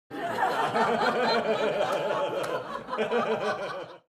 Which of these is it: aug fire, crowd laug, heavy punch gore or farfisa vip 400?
crowd laug